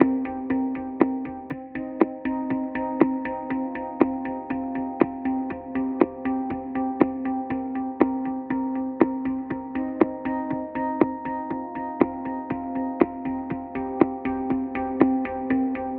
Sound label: puzzle solving music mysterious; ni sound emotion loops; alarm sounds